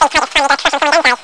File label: tiger crush; charley cat corral quarrel